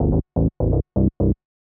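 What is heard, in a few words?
synth bass, house, 125, A; eJay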